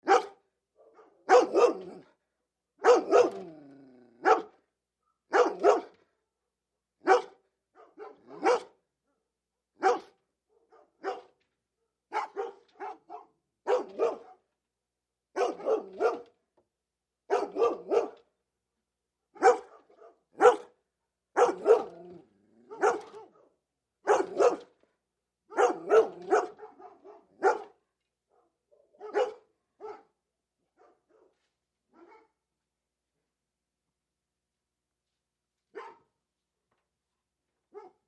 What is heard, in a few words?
Bernese Dogs Bark